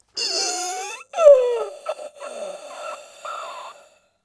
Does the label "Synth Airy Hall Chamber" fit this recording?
No